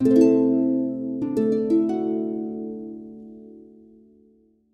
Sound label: Stingers Sleep; Mechstermination Force